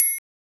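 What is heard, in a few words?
TRIAN, triangle, Modern Percussion Loops; Soundscan vol.56; AKAI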